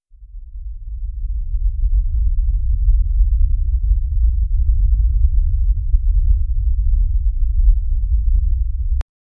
power up aura with calm, heartbeat pulse

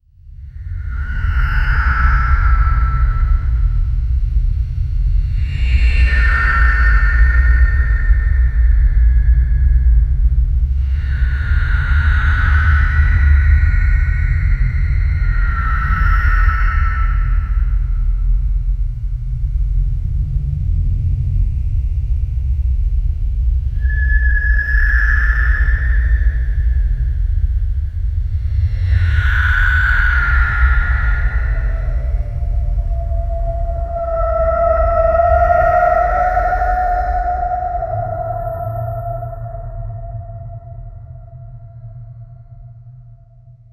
a slow echoing dark ambient

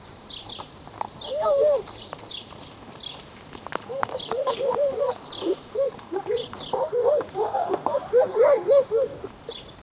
cinematic outdoor village

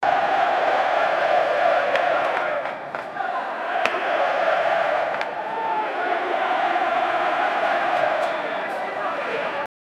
soccer video game

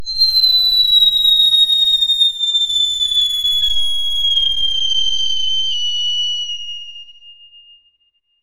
an incoming war shell wit, explosive

